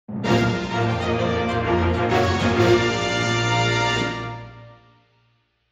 jingle clear; hortensia saga

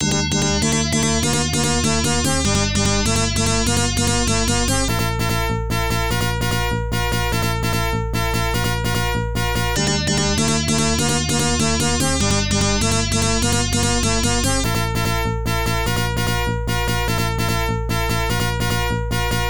Boss BGM; Iron Horse; Dai Ressha Goutou; arcade

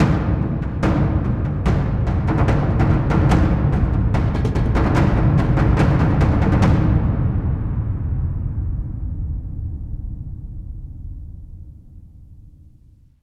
Pvp

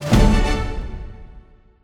jingle 5x multiplier; Seven Seas Solitaire

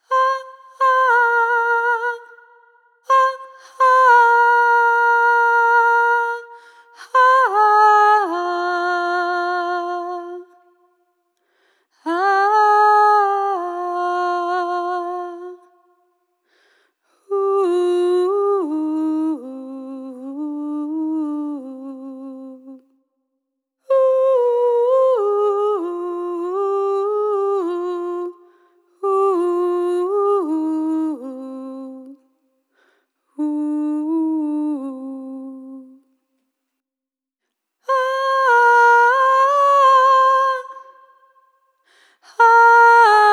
Leitmotif Vocals; saturnalia original soundtrack 2022

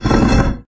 Girder Move

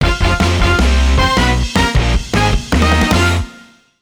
Touchdown; NFL Rush Zone; Leapster Explorer

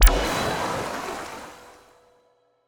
stinger mastery

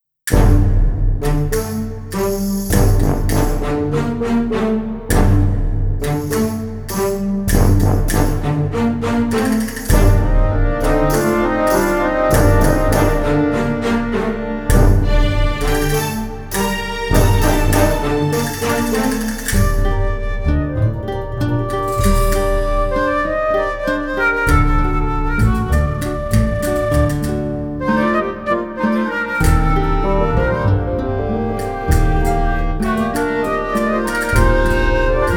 Medium Spanish Port; pirates of the burning sea game soundtrack 2007

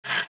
Vent

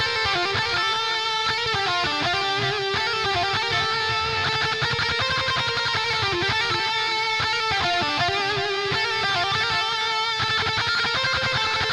Drums and Jingles Battle LEAD

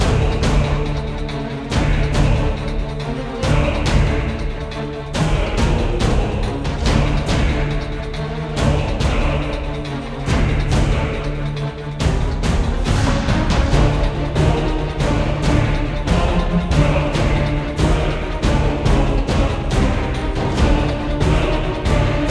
Demonized Battle Loop; Hell Warders

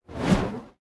vault outro; talking tom gold run gamerip 2016

